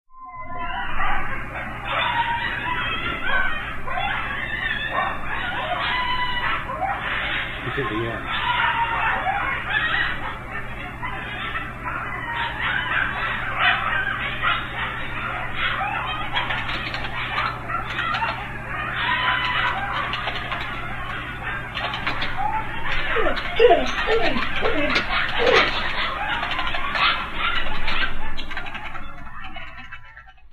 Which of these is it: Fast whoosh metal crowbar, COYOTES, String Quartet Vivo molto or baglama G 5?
COYOTES